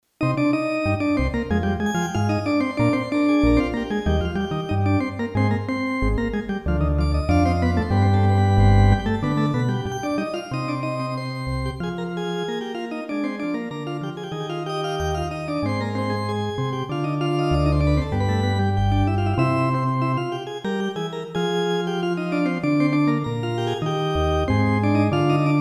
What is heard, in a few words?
organ; pc gus kq4